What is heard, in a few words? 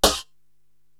SD Funky Sneezer, Korg TR-Rack drum machine